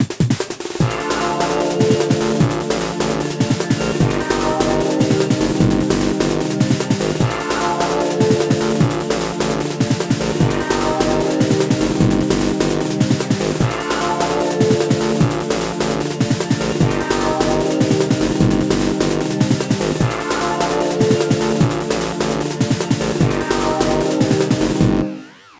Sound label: Breakbeats; android; Danmaku Death